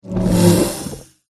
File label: turtle attack middle